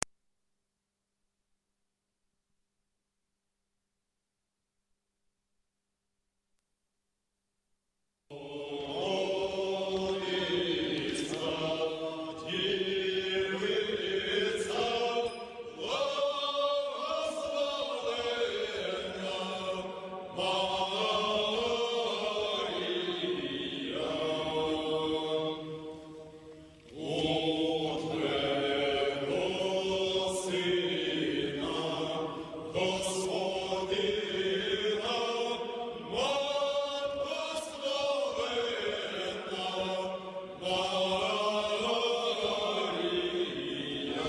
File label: Ukrainian song 17 century; Ukraine